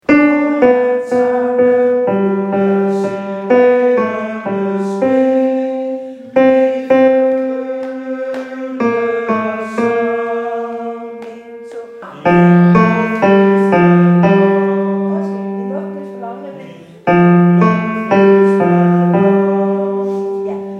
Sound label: TENOR